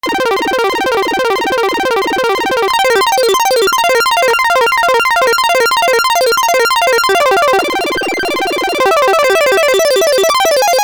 Turbo Wave Arp, sequential; アルペジエーター; Turno Wave

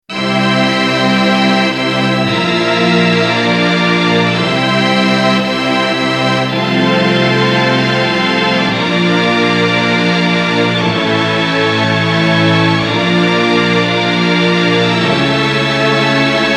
New String Section

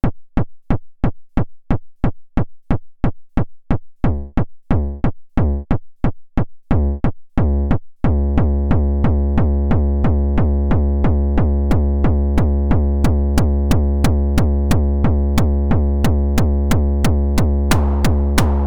drm1mk4 hardcore

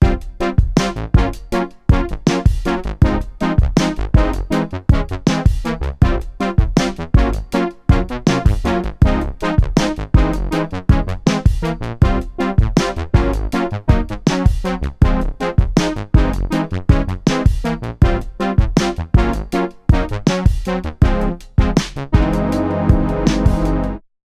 BRASS; BRASS FLUFFER

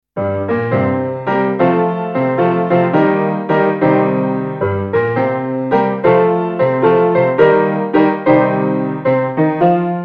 mk2 piano

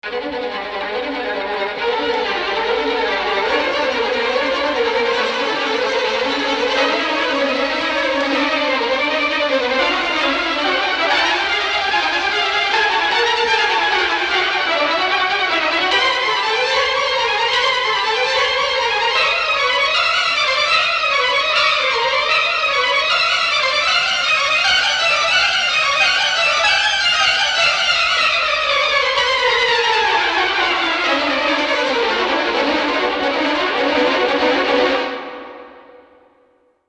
Violins Phrases

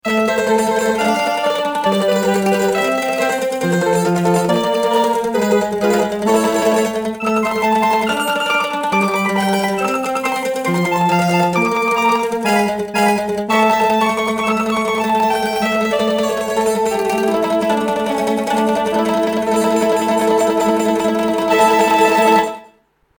mandolin

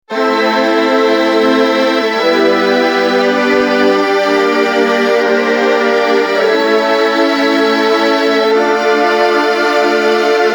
new 3 violins

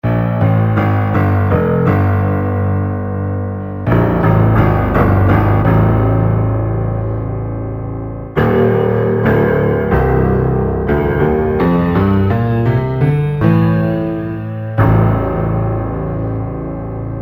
grand piano

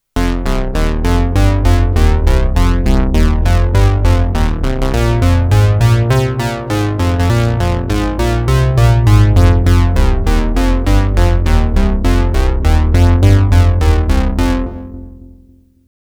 BASS

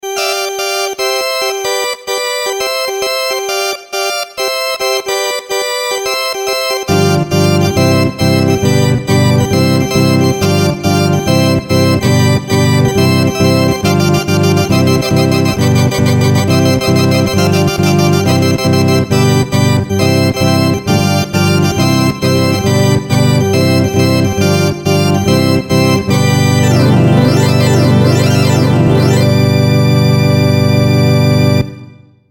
vox super continental organ

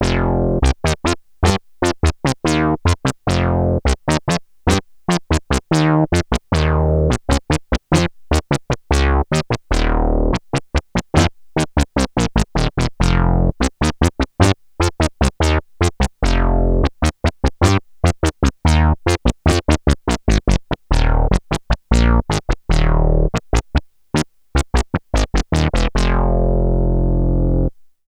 THICK ACID

